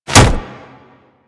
Sword Board Catch; Warframe; Tenno

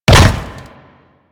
Land Metal, Footsteps; Warframe; Grineer

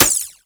Sapient Pistol Mech; Warframe

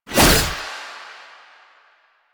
Naginata Whoosh; Weapons; Melee; Warframe; Koumei Naginata

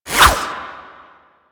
Baton Whoosh; Warframe; Lasrian; Lasrian Soldiers; Weapons

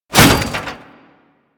Restrained Foley; Warframe; Solaris; Solaris Worker

